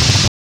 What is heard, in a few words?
DROPFIRE, PHUZZ DRUMS; Spectrasonic Distorted Reality 1